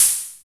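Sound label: HH, hi-hat; Sound & Vision - Gigapack I; DANCE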